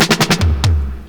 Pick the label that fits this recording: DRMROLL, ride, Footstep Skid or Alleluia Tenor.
DRMROLL